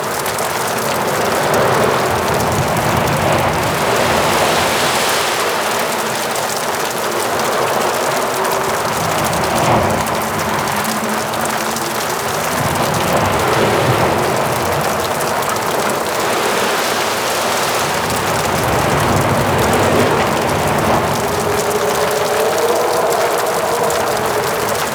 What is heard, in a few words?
Ocean Ext Amb Loop, Exterior; Warframe; Ambience; Grineer Ocean